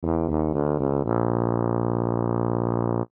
Melody Chromatic, Melody Chromatic Descend Sad Low; Musical; Toy Melodies; Cartoon; Toy Melody Sound Effects